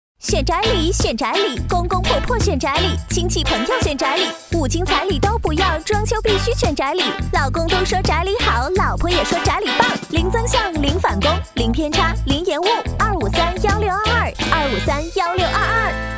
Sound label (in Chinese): 歌曲洗脑欢快年轻广告歌; 宅里